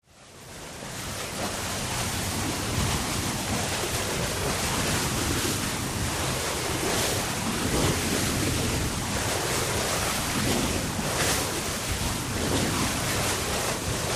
Ferry Boat, Medium Fast Speed with Bow Wash.

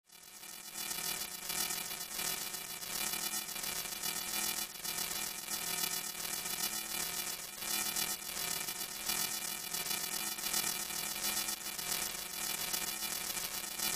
Elect Energy Field, buzz

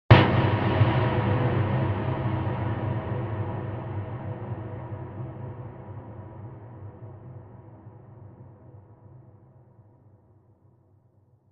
Impact Metallic Thum

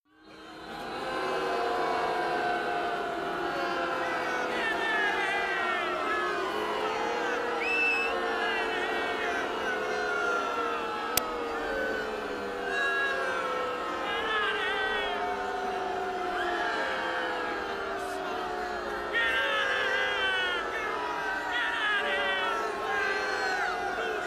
Football Crowd Stea, sports arena football

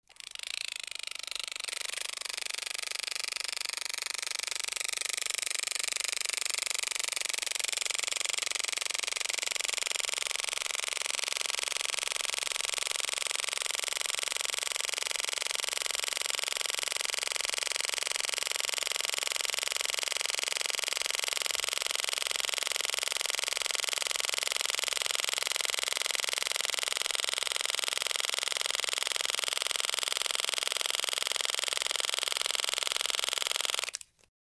8mm Movie Camera Clic; projectors

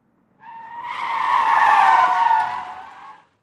Sedan Accel Tire Skd

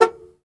Jeep Chrke Horn Honk; jeep cherokee 84

